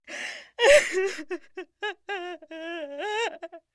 crying; svencoop

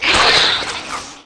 attack, facehugger; svencoop; aliens

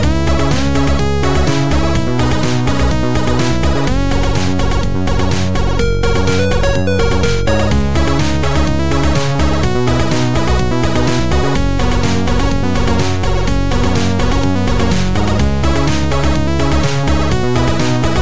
100 complete; svencoop; scxpm

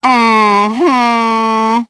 mmm hmmm; svencoop

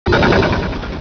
arch attack; svencoop; portal house

